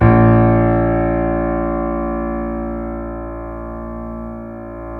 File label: C7 PP, The Ultimate Piano Collection; East West